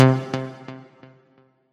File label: combo; quatuor